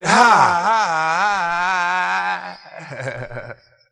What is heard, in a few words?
hai laugh; ooh ahh